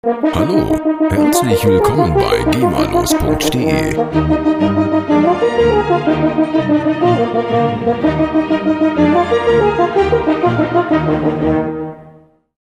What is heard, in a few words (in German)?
waldhorn